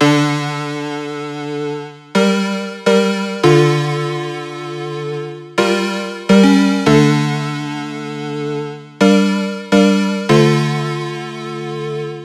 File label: Riddim Synth Loop D (140); Rebirth; Ghosthack; Riddim Essentials 2020